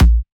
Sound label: Kick, Data Mosh Hits; 99Sounds; Mangling Audio